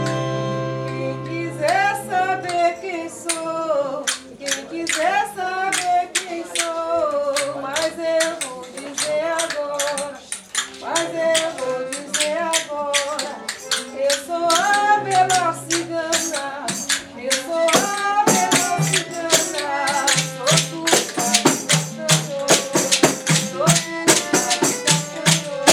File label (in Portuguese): fanti baiao de princesas quem quiser; Baião de Princesas é um ritual especialíssimo e único que acontece apenas uma vez ao ano